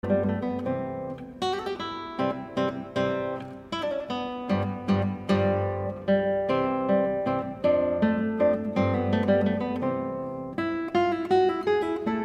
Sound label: Guitar Sonata, Menuetto